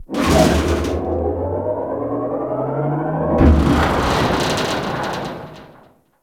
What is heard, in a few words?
Ship External Machinery; Warframe; Corpus Ship Remaster